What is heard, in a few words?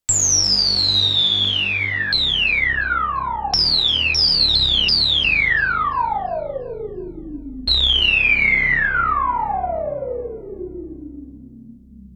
Rush Rezz Sweep